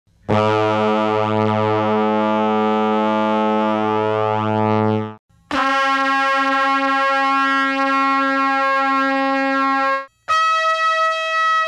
brass, Mellotron

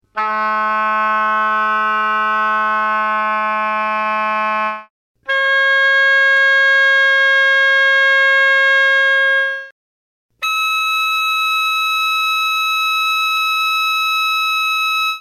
oboe, mellotron

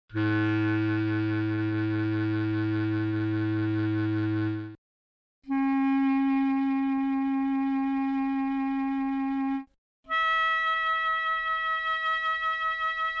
clarinet, chamberlin; bass clarinet

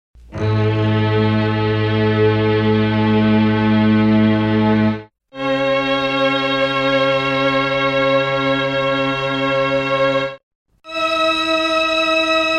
strings, mellotron

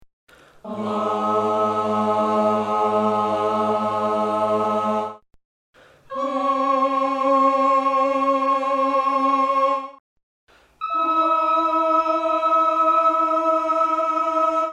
Mixed cho

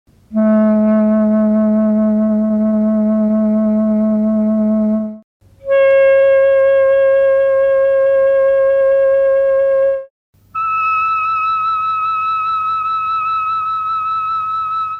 Clarinet, mellotron

